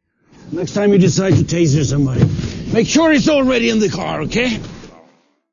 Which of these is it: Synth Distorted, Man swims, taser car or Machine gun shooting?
taser car